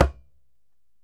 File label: DJEMBE, African Drum